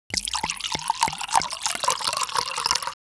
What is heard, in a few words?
Pour Wine In Glass